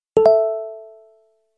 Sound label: ringtone tone notification; bbm